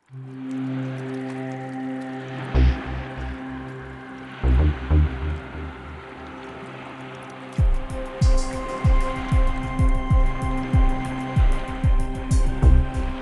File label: Rain & Surf; Worms World Party